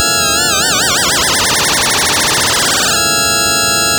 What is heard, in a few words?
first resonance, synth